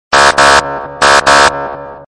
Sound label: russian police